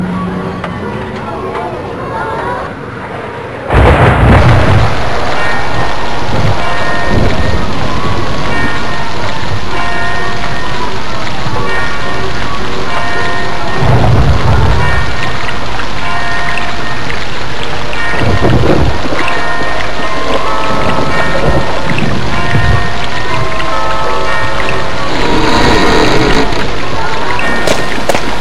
romantic rain tone

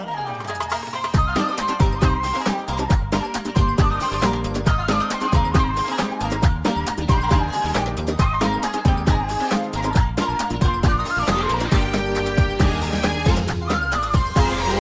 khawater musik

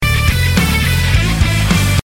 GTR Solo

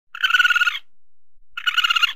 raccoon chitter

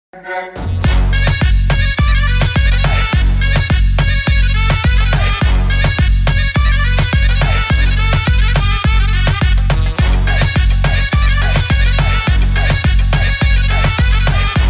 Turkish Arab Trap

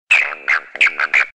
Frog msg tone

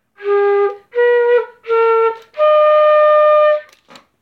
Flute notification

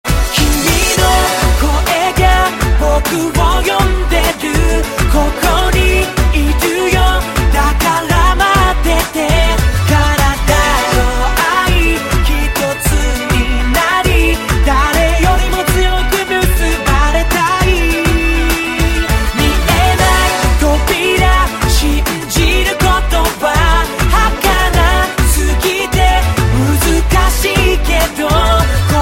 Chorus

